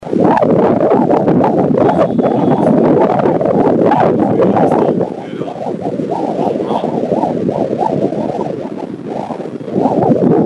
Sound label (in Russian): Buben Ultra